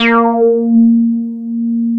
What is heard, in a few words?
A#4, Bass; E-MU Producer Series Vol. 6 – Analogia Project (CD 1); Boom da Bass 2